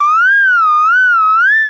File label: Stars Sound; Jolly Battle